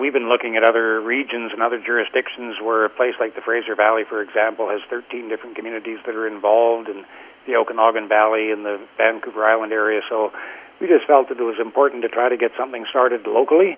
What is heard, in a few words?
Tom